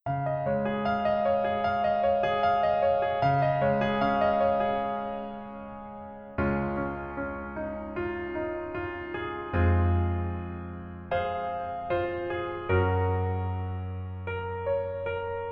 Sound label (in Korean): Piano ver 멜로디 MR -1 키; 꼰대 인턴